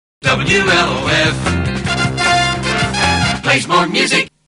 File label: jingle Plays more music; WLOF